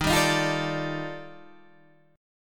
D sharp Major Sharp 11th D sharp Guitar Standard, chord; strummed; 11,10,13,10,10,10; JGuitar